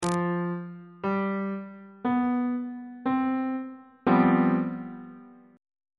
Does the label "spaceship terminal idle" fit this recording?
No